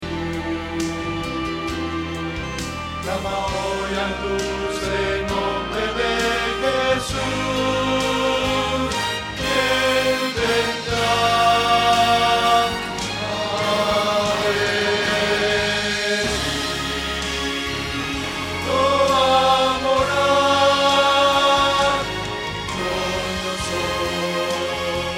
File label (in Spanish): Bajo